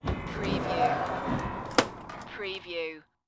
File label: Attic Stairs Open; Household Sounds / General Household; sound effects bible